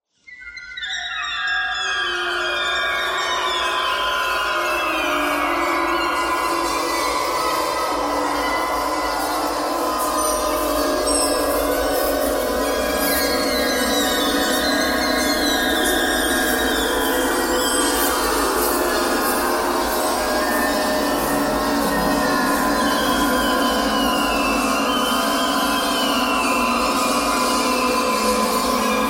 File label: clarinet jazz clarinet thread noise; clarinet geese